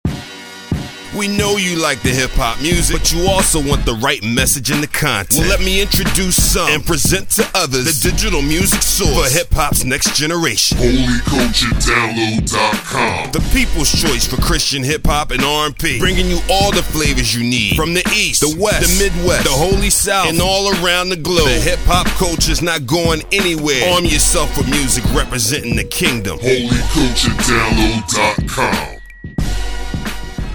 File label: commercial music